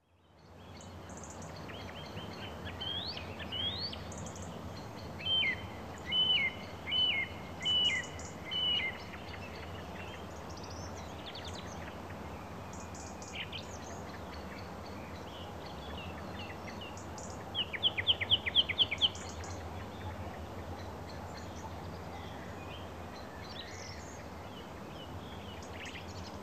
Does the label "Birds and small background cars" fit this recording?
Yes